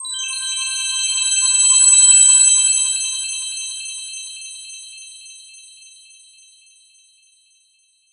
wipe

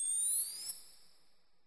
absorb

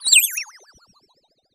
fall

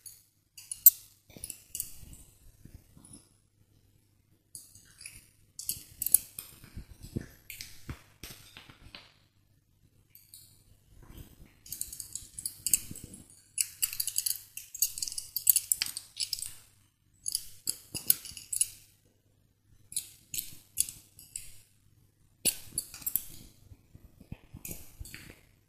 belt tight; operation

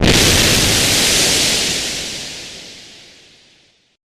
blast

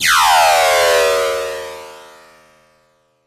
power-down

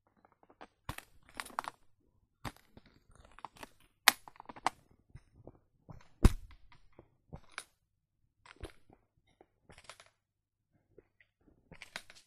crush the box; operation

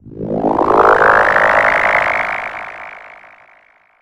beam

